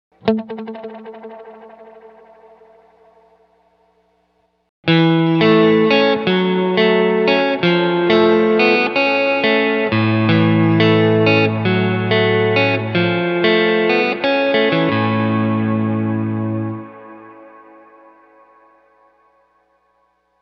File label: magneto; BigSky